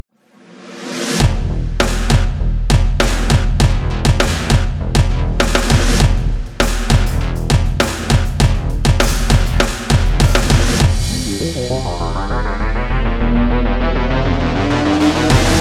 Fight Start; evacuation; windows gamerip 2023; Camp

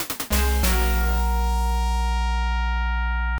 Race Start; quad challenge megatrax genesis

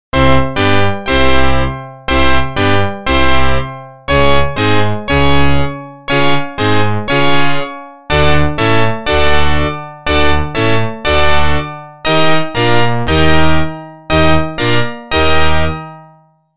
Organ; NHL 94

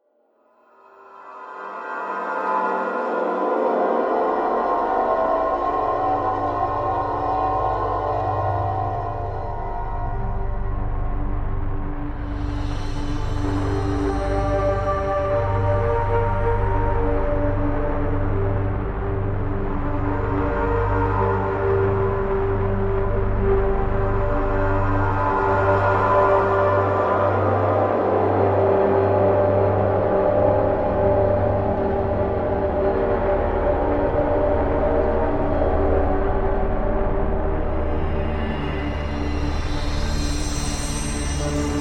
lab tank music; painkiller battle out of hell

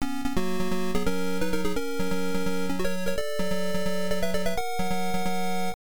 Checkpoint; Road Fighter; MSX; PSG

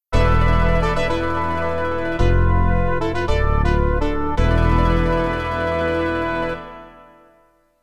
Jingle (MT-32); zan 2 kagerou no jidai; pc 9801

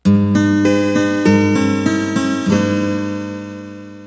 Acoustic Guitar (fourty notes); Harvest Moon: Magical Melody

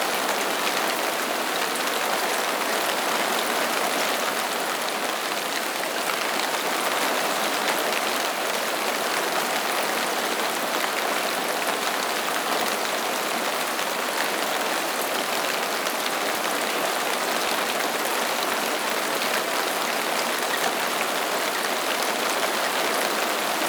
anbience rain light outside close Vegetation

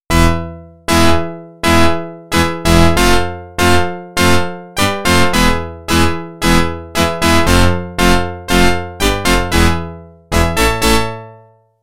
Organ; NHL 94

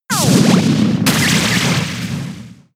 Shell Smash; Pokemon; gen 6; XY; ORAS

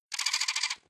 Sheep Voice; Doodle Jump 2

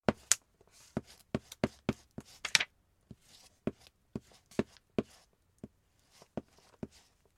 Photo Develop

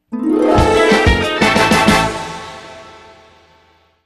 Mission Complete!; the simpsons hit run; ps2; Not Leaf Blower Aliens, Tentacle Aliens!

